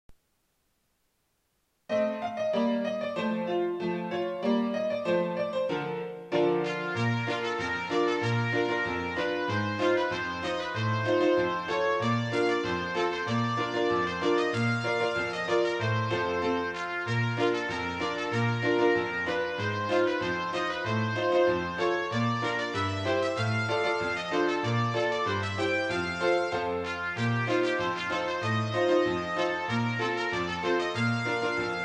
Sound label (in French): polka cuivra